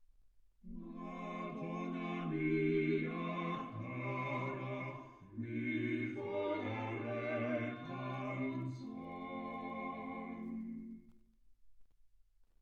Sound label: quartet